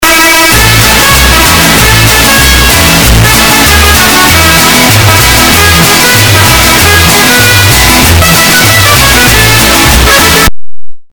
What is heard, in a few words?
La Cucaracha LOUD